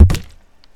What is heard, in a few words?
Water Bottle Snare Desk Tabla Splash